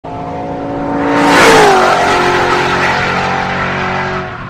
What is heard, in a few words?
BMW Z8 Flyby; Hamann